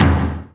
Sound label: Drum Hit Med Bass